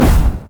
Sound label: mage chains; LoL; ironforge xerath